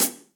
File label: Hihat Closed Direct Natural; Drum Hits; Session Drums Studio; MPE Hits